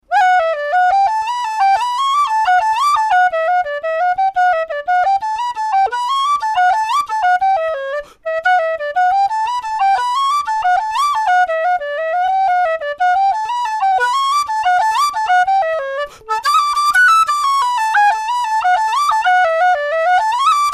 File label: Whistle A Jig